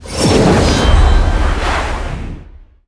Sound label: teleport